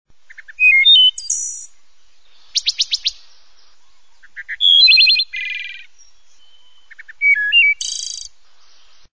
wood thrush

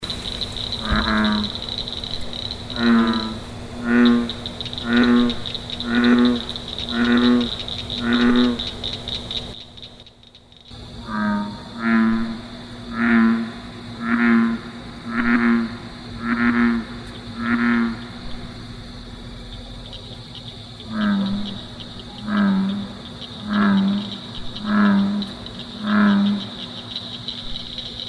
American Bullfrog; Wildlife

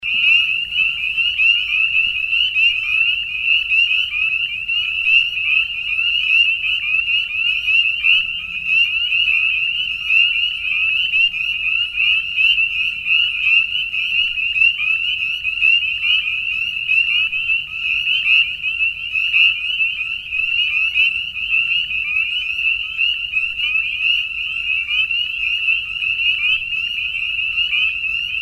Spring Peepers; Wildlife

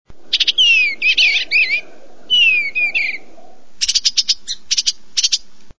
bluebird

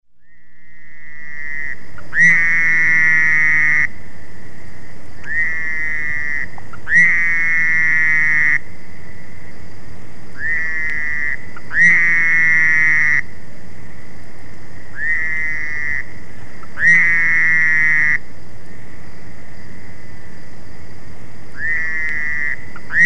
fowlers toad; True Toads and Spadefoots; Wildlife

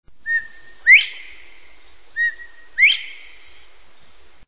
bobwhite quail

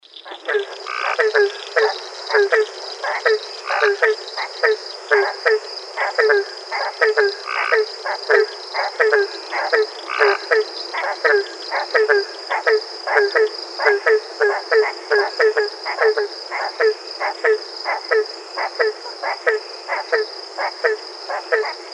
Barking Tree Frog; Wildlife

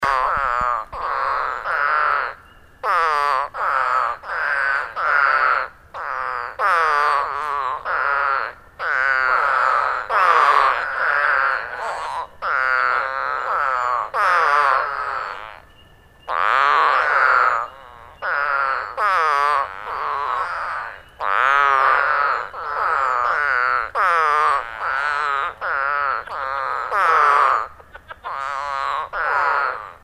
Eastern Spadefoot; Wildlife

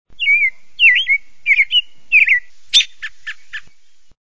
american robin

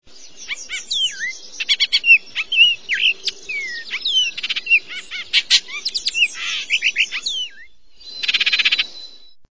baltimore oriole

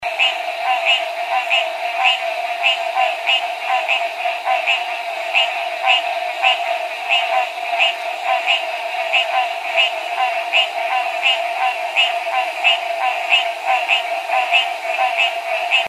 Green Treefrog; Wildlife

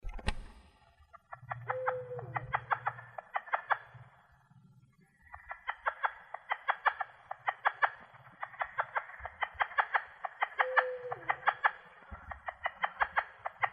Plains Leopard Frog; Wildlife

